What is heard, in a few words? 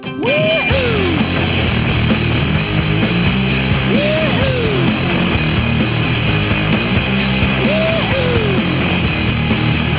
snom ringtone